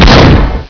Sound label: shot, weapons